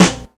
sharp snare sample f sharp key

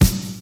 round punk snare drum sound c key; 80s

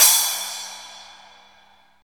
big crash cymbal single hit a key; cymbal drum samples

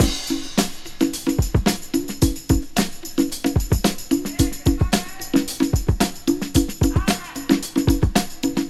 111 bpm modern breakbeat g sharp key

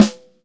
snare sound g sharp key; snare drum one shots

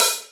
smooth hi hat sample B key, Tuned drums

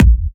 good bass drum one shot a sharp key; kick drum samples

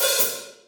thin open hat sound d key

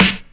sizzle snare one shot g key; snare drum samples

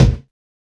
mid range kick drum single shot c key

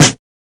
reverb snare single hit a sharp key; snare drum samples

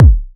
dry bass drum A key; Duration 0:01; Channels Mono; kick drum samples

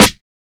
2000s subtle reverb snare drum sample g key; modern snare drum

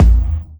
tight steel kick drum a key; Duration 0:01; kick drum samples

